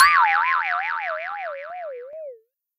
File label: Minigame (Lose)